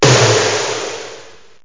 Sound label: tom7 (fx); Octamed 6; walkabout; fx perc